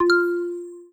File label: power; dark mystery; ps4 windows